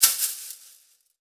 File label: BRAZIL TRIO, Percussion; Voice Crystal - Steve Reid Definitive Percussion; BRAZIL SET